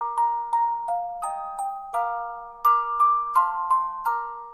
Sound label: sad sound